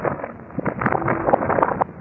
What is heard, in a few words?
fissure destroy; weapons; dota; earthshaker